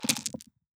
DICE on Felt, Throw and Roll, Standard, 16 Sixteen Dice; Articulated Sounds